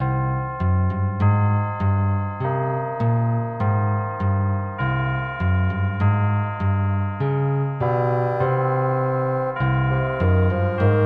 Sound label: suspense